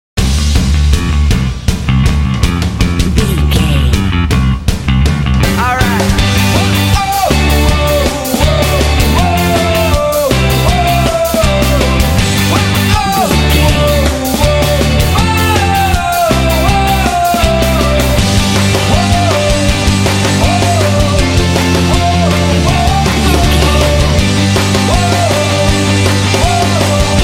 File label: rock indie rock vocal mix